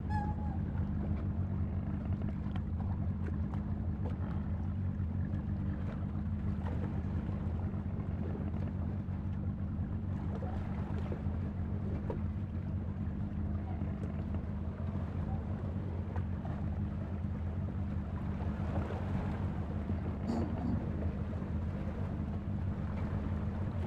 Sea Inside

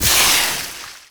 Steam Sizzle Acid Burn

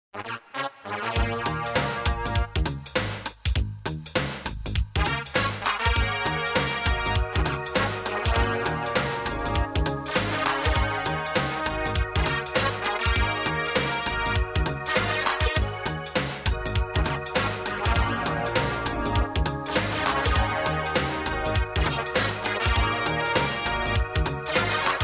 Jingles; 90 DDD Jingles

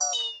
bomb off; Apotheorasis

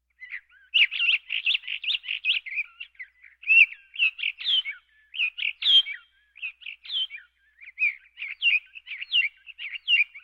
Birds

